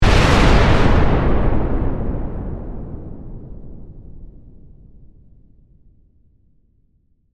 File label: Explosion Massive, Warfare, Explosion, Massive; Bomb Sound Effects; Explosions